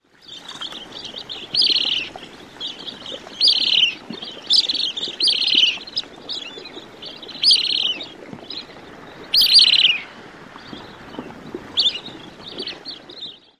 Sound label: calls